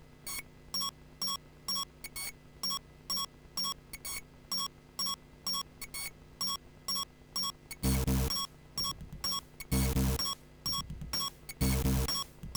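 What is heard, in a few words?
Intro SB16 CT2770, Sound Blaster; System Shock (Looking Glass)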